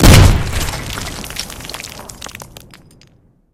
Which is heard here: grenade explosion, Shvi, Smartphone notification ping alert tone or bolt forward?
grenade explosion